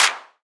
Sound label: clap sample f key, Royality free clap sample tuned to the F note. Loudest frequency: 3834Hz; percussion; single hits one shots